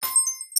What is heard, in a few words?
coin